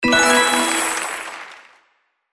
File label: slot common